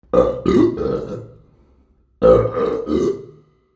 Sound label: burp